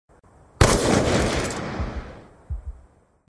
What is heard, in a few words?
dsr 50 gun sound; black ops 2